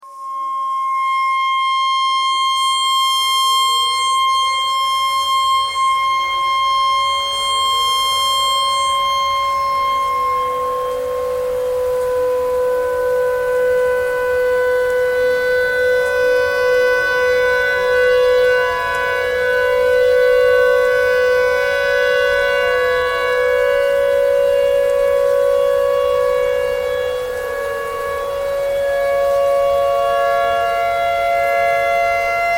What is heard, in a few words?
tornado siren clarinet notes e noise